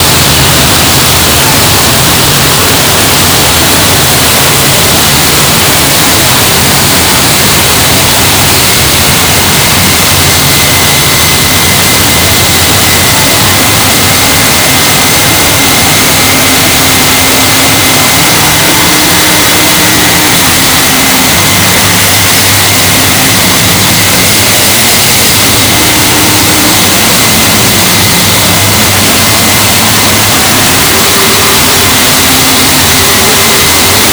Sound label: ventilator